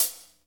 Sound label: HH, KIT Real-Kit; 90 s Sample CDs; Sound & Vision - Gigapack I CD 1 (Roland); Real-Kit s11